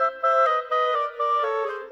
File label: Clarinet Oboe, Orchestral; Sonic Foundry (Sony Creative Software); Orchestral VOL-2; Modern 27